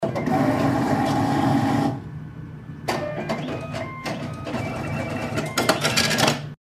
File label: money out, banking; garrysmod; glorified banking